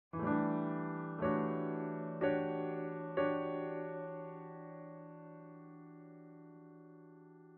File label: Piano; unsighted; Factory Boss Piano08